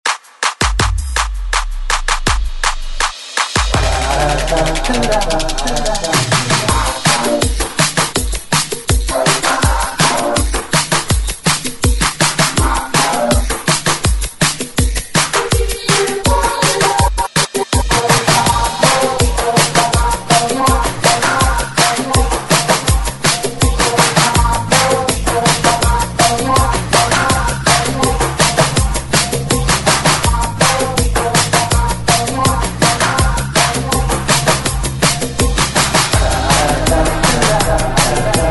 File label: 163 BPM; CMX Redrum